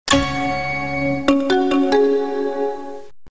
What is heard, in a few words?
pentium ii inside